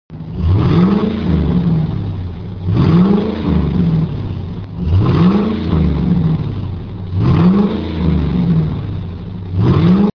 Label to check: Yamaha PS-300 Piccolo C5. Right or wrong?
Wrong